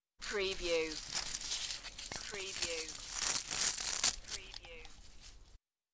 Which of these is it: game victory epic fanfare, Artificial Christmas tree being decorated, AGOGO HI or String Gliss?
Artificial Christmas tree being decorated